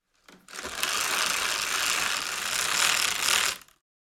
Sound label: Fabric venetian blind roll up; blinds